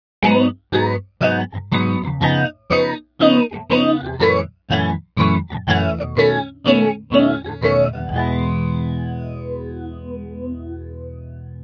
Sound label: classic phase; pedales; Hyper Phase